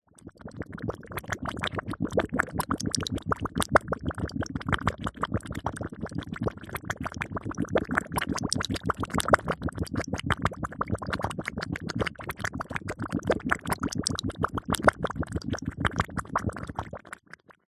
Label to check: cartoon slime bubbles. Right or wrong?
Right